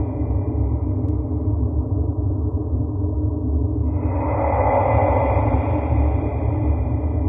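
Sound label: ambient creepy breath; hellhole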